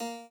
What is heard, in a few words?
chord